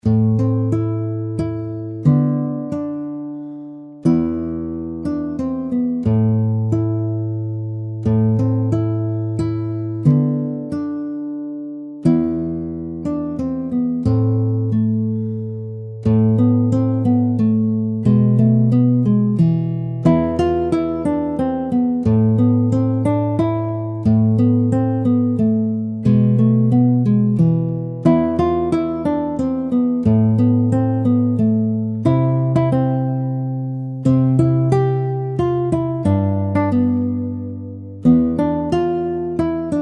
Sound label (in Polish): czerwone jabluszko gitara